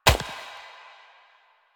Step Flesh; Warframe; Koumei

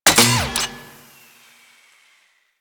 Torpedo Pistol Mech, weapons; Grn Torpedo Pistol

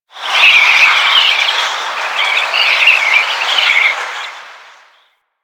Mountain Pass Wind Gust Whispy; Ambience; Warframe; The War Within

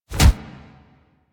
Charge Body Impact; Enemies; Purgatory Warrior; Warframe

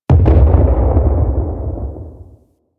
Space Exterior Explosion A; Warframe; Corpus Space

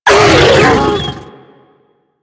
Infested Juggernaut Vocal Pain; Warframe

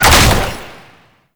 Ranged Weapon Fire; Warframe; Necramech

